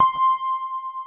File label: MUL BELL C5; East West - Global Sounds